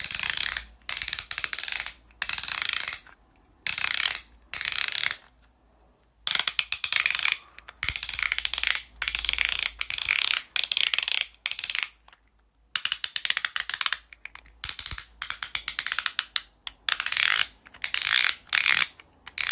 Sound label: fidget clicker clacker; Golden Crayon Sounds